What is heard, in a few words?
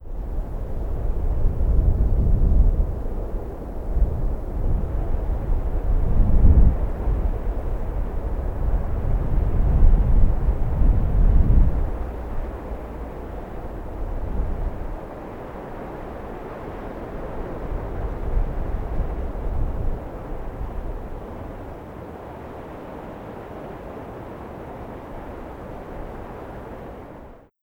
Arche Wind